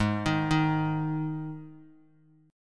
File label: G sharp 5th G sharp, Guitar; arpeggiated; JGuitar